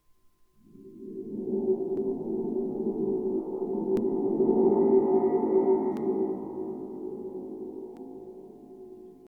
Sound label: Springdrum